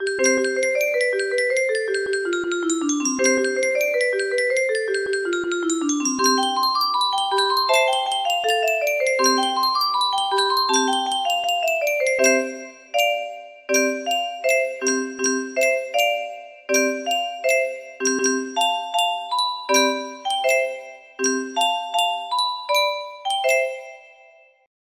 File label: music box melody; how to train your dragon